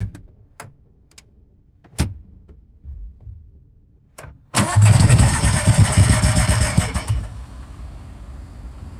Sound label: car engine cranking and stalling twice; vehicles